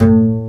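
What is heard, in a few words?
String, contrabass pizzicato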